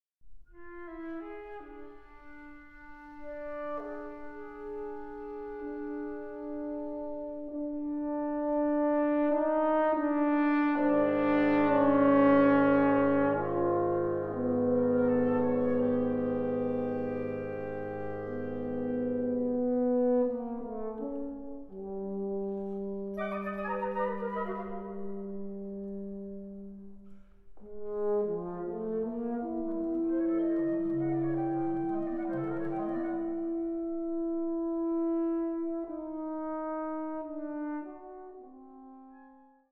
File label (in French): Musique pour vents, Quintette; Hetu; Quintette op 13 IV